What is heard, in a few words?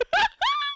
High pitched song like female